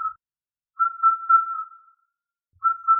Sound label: Indian cuckoo